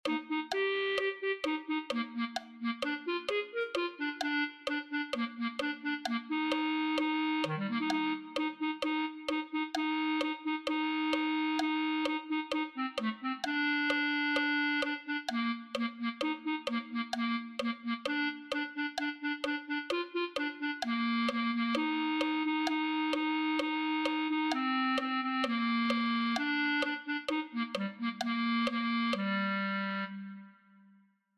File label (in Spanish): clarinete